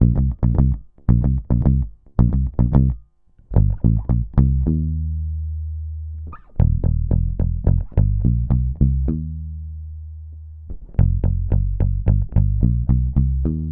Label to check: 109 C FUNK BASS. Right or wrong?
Right